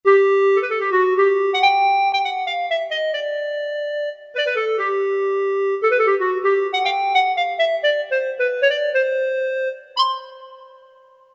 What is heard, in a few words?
Clarinet 2; Roland MT-32